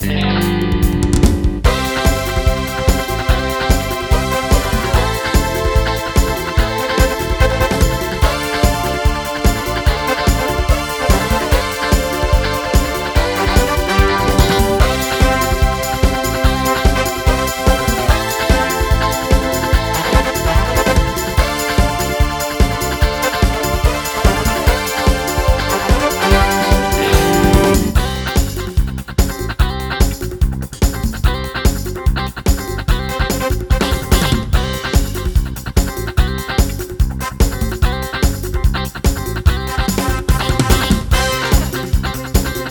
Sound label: Retro Disco; KORG PA4X; vArranger2; SD9 SF2